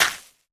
可疑的沙砾放置声

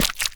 青蛙卵破坏声

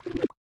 幽匿充能声

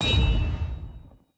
三叉戟返回声

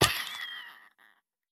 海龟幼年死亡声

